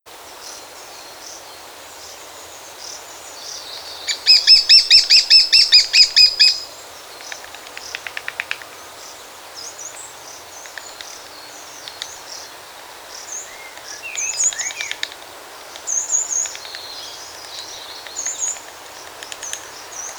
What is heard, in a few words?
Lesser Spotted Woodpecker